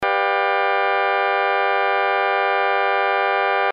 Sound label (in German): sept unrein